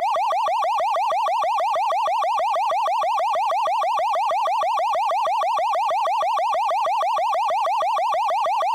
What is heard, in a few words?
increment; bigwin; Beach Party; wazdan